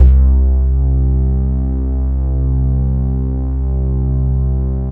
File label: SYN BASS